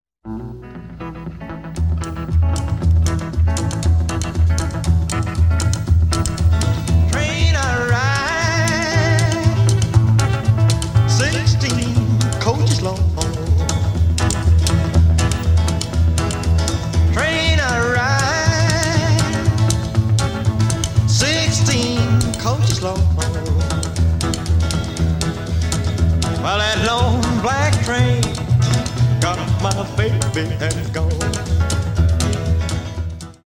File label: homophonic